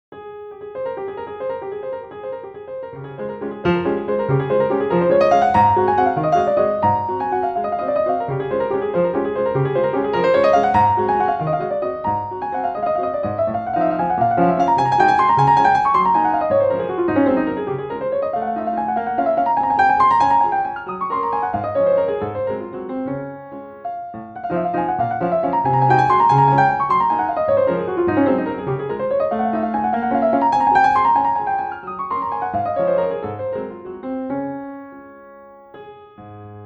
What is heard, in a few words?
chopin waltz